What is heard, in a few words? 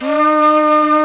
flute vox, singin' flute; FLT; Jazz; Roland L-CDX-03 Disk 1; Jazz+Singin'